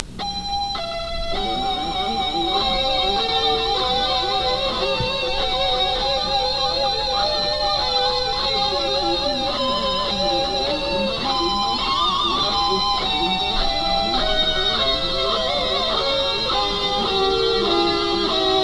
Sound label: metal